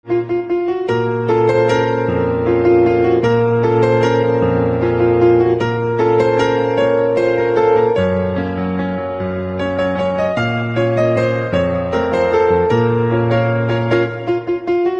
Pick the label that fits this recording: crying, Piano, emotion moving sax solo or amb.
Piano